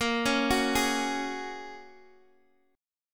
Bb Minor 7th Sharp 5th, Guitar, Open D

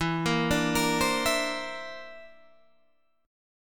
D 7th Suspended 2nd Sharp 5th E

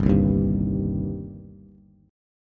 G Suspended 4th Sharp 5th G, down, Bass; Gsus4#5 strummed; JGuitar

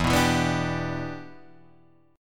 E Minor 7th, Guitar, Drop D

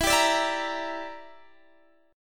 E Minor 7th Sharp 11th; strummed; Em7#11

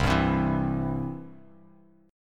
C Major, Bass Standard; strummed; JGuitar

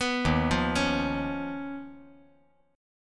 D sharp 7th Suspended 2nd Sharp 5th; arpeggiated; JGuitar

